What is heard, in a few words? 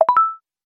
sms ringtone; gallatin